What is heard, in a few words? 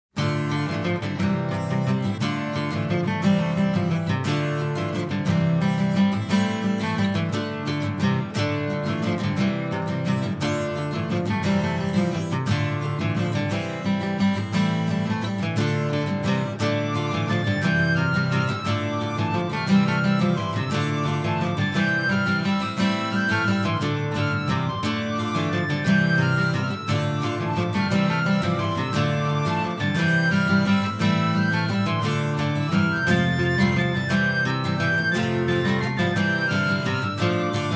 depth melody forest chant